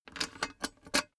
cash box metal lock; maschinen; soundpool

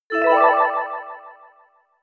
arcade bonus

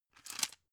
drug blister pull out; berufe; arzt

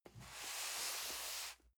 cardboard box medium slide soft; objekte

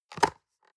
box small open hard

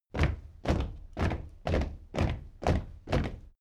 step military march regular

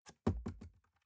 cardboard box open unfold bounce soft; objekte

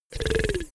monster growl short; kreaturen

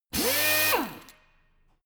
impactor high; maschinen; bohrer

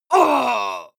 male combat hit hard

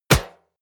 hollow short medium, hits impacts; whooshes rises

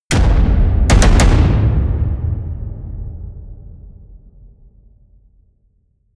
promo pad; whooshes-rises; hits-impacts

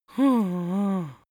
strongly disappointed boy; comedy-cartoon; soundpool

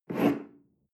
espresso grab tablecloth slide

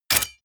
mechanical switch metal small push; industrie-wirtschaft; mechanik

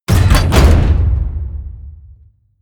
mechanical switch metal large push; industrie-wirtschaft; mechanik

